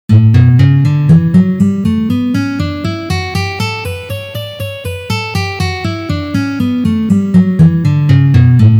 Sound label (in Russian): Gamma Re mazhor Tri noty 2 pozitsiya